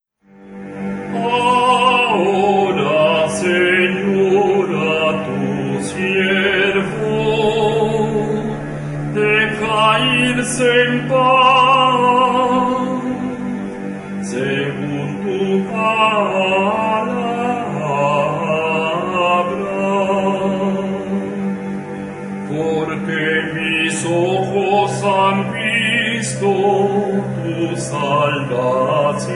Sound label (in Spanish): canto del simeon